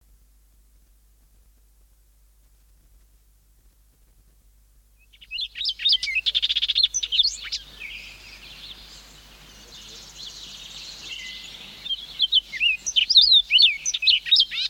orchard oriole, songbirds

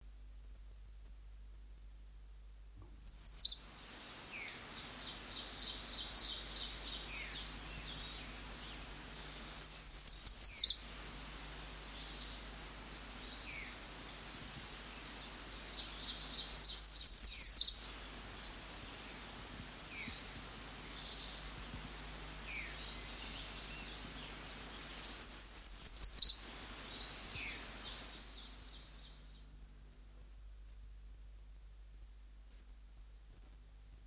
american redstart

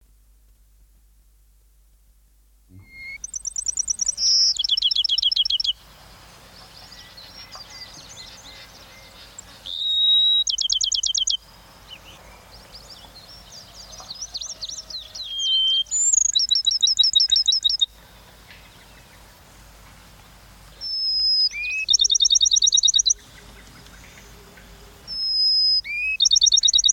bachmans sparrow, songbirds

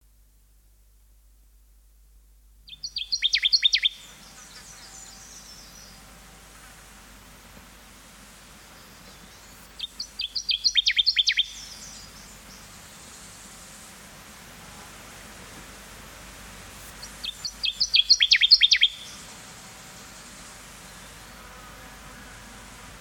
connecticut warbler, songbirds

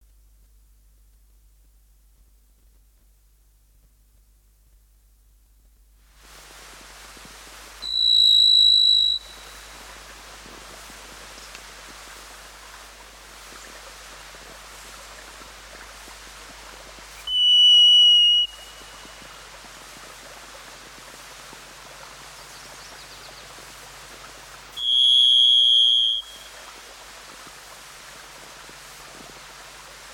varied thrush, songbirds

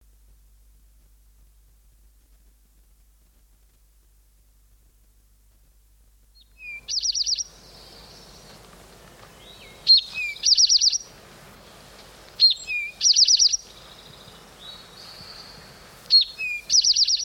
eastern towhee, songbirds

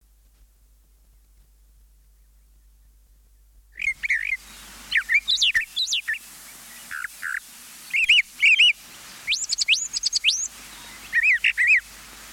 brown thrasher, songbirds

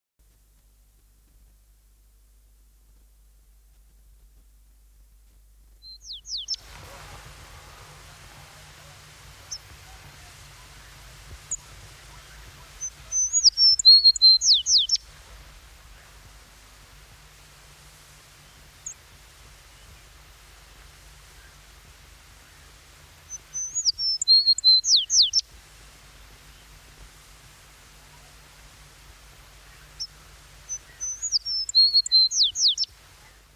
tree sparrow, songbirds

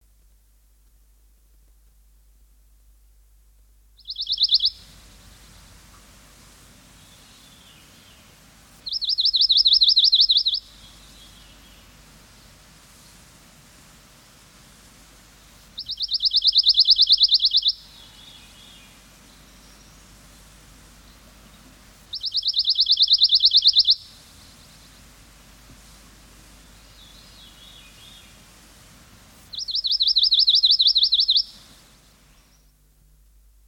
pine warbler, songbirds

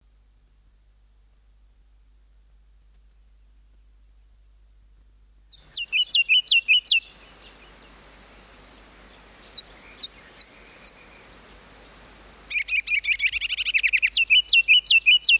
ruby crowned kinglet, songbirds

